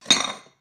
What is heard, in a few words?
dishes